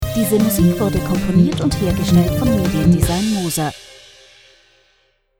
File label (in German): jingle dtsch